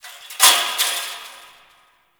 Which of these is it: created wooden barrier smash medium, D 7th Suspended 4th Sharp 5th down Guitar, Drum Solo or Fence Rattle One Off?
Fence Rattle One Off